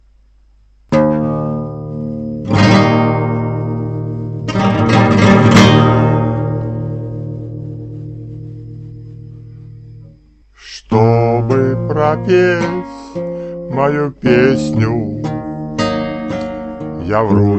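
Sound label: guitar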